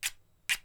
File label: matches scratch